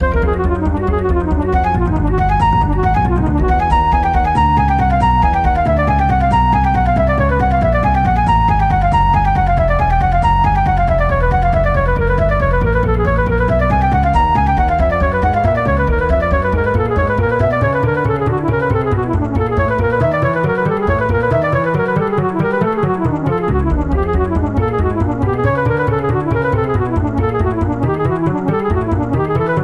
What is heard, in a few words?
lines, some unreal jazz flute playing; impro; I've been improvising and synthesising